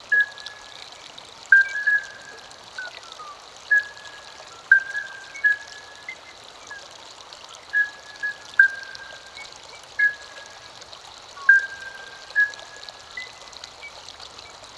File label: ambiance; DS school project game